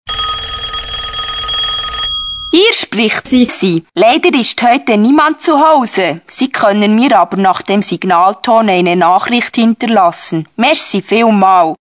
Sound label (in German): Klingelton